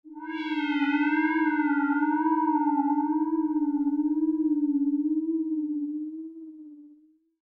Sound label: shredding synthhit; zps; Ambient